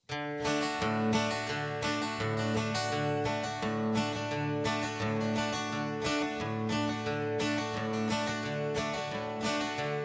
(D) No Backing Vocals

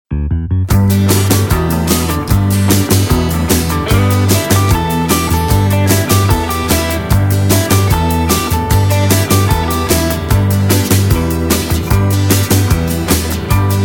No Backing Vocals

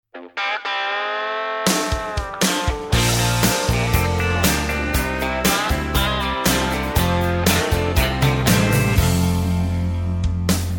(F) No Backing Vocals

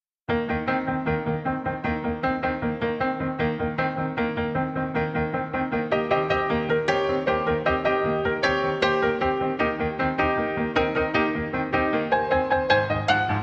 No Backing Vocals

